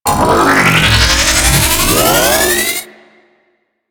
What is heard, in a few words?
Beam Attack Charge; Enemies; Warframe; Vomvalyst; Teralyst; DE